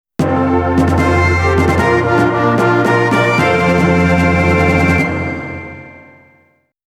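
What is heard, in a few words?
Full Complete ファンファーレ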